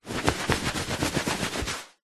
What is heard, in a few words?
towel rub body skin fast; foley